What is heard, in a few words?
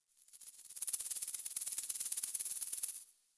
Forest Creature Rattle High; Warframe; Grineer Forest